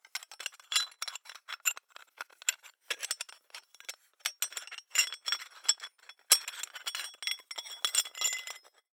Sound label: Hole Saw Rattle, Hole Saw Bits